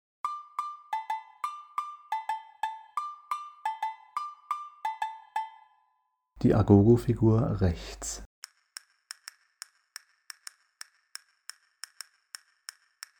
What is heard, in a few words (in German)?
komplementaer zu agogo